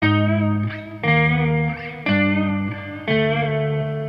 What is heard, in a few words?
Guitar Msg